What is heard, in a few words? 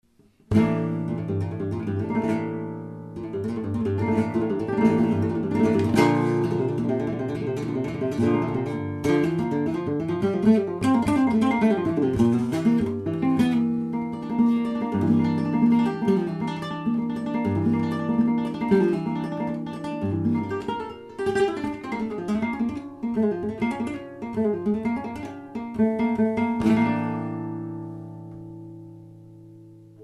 minera, flamenco